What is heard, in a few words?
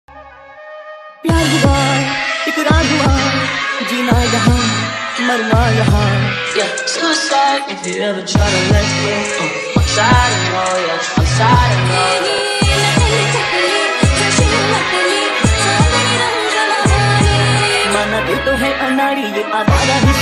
English × Hindi Mashup New Dj Remix ringtone